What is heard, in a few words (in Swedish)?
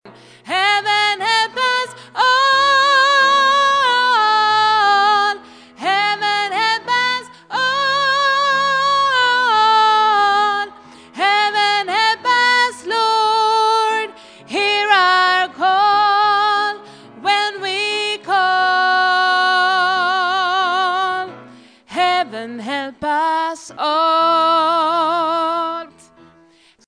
sopran